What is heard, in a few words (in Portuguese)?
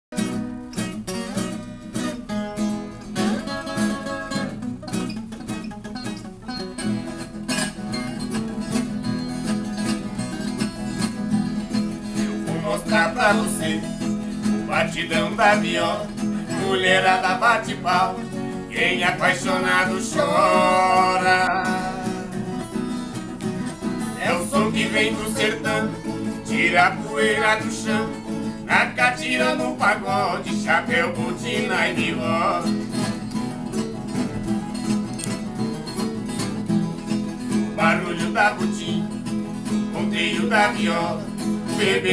viola